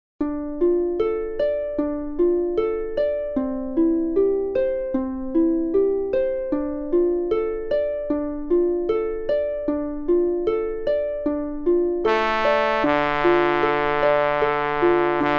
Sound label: bass; backing tracks